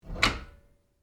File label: Door Open French, Foley, Door, Open, French, Door; Miscellaneous Doors; BlastWave